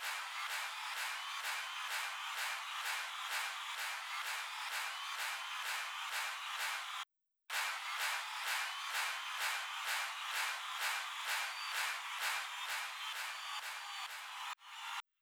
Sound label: Drum Loop Crowd, 128BPM; VEC5 Drumloops; VEC5 Multi Loops 128BPM; Loop Elements